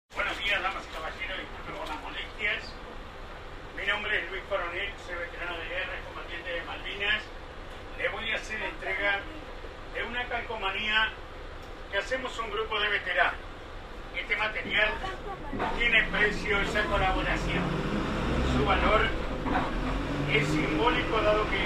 transportes linea; 103 negra veterano guerra

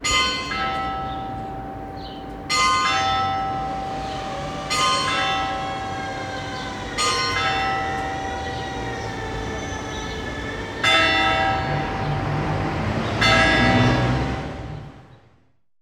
carrilon relojeria sudamericana; rosario

